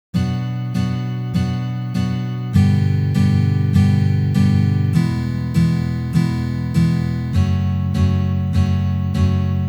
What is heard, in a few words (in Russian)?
Uprazhneniya dlya igry akkorda Si minor na gitare